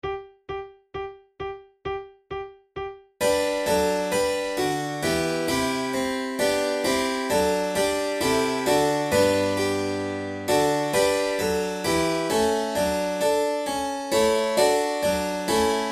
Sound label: flute sonata in g major bourree; handel